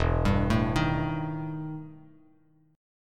E Minor Major 7th Double Flat 5th E Bass Standard; arpeggiated; JGuitar

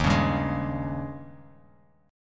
D sharp 11th D sharp, Bass Standard; strummed; JGuitar

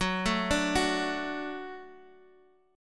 F sharp Minor Sharp 5th, F sharp, chord; chordshape; down

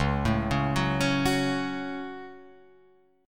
Db Suspended 4th Sharp 5th, Guitar Open F; arpeggiated

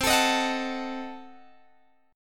C Diminished 7th C, Guitar Open G

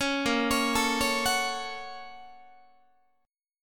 A sharp Minor Major 7th Sharp 5th A sharp Guitar; A#mM7#5 arpeggiated; JGuitar

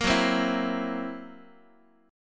Fsharp 7th Flat 5th down Guitar; F#7b5 strummed

